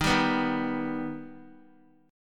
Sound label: Eb Augmented, Guitar; Eb+ strummed; x,6,5,4,3,x; JGuitar